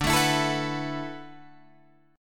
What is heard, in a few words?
D 6th Add 9th D, Guitar, Drop D